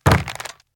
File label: Jump; moul